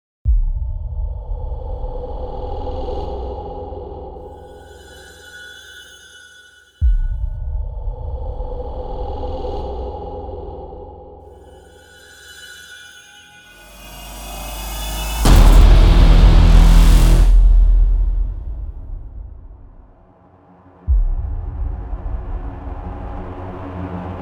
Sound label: Black Hole; McGregor SFX